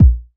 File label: kick machine